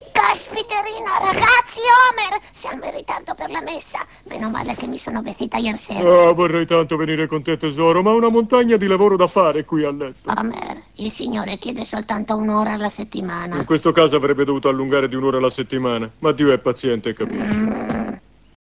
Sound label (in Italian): messa